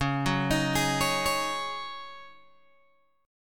C sharp Suspended 2nd Suspended 4th, C sharp, Guitar, Open G